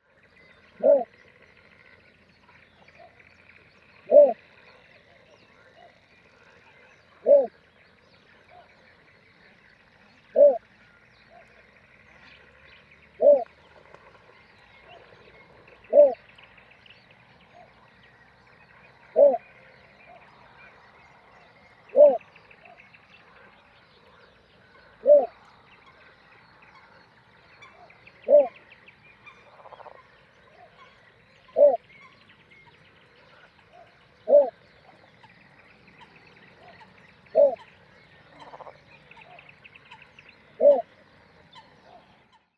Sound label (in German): Zwergdommel Ixobrychus minutus